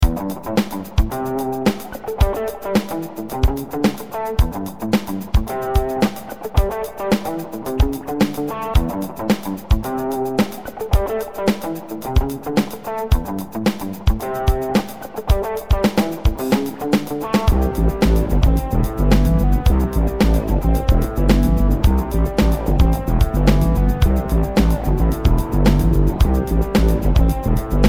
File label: rock